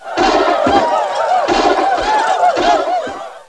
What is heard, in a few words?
ELEPHANT; INDEPEND